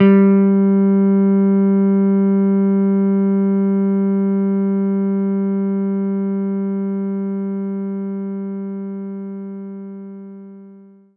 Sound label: Jazz Guitar G3; knights try